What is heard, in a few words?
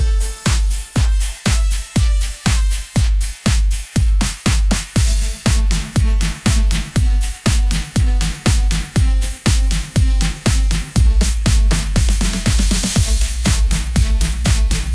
upbeat pop melody, ringtones